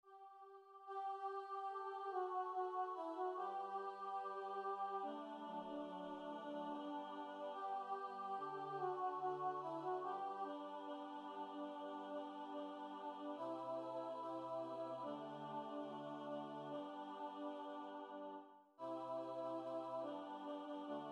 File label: alto; Zucchini Mass 2; Sanctus; Sanctus/Benedictus